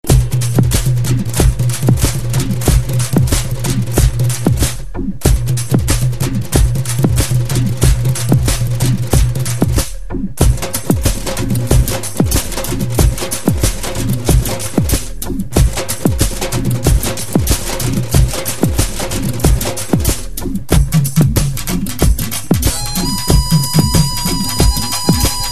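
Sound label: south indian rhythm ringtone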